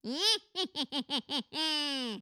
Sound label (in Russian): Смех ведьмы короткий